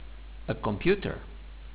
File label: computer